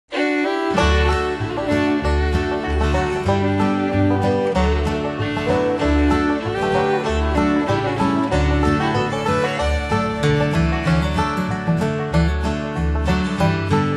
Acoustic Gospel Songs

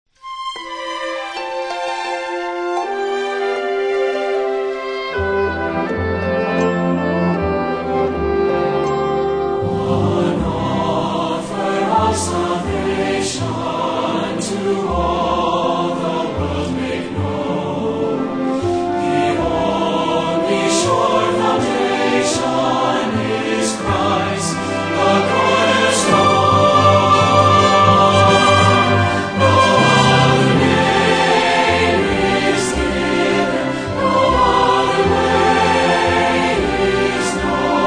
Singers And Orchestra

